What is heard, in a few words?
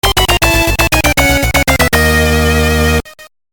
Defeat